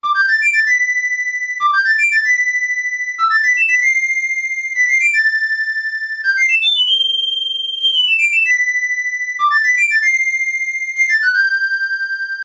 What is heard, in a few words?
Flute Extended; Flute Boy; The Legend of Zelda; Link plays the Flute at its full theme